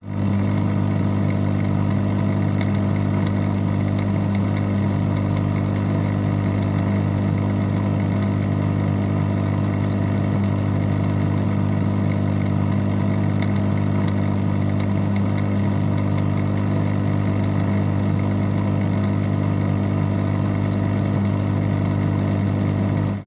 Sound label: household fishtank filter buzz hum; zapsplat